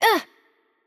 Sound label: Hurt Light, ro RO; bloom minigame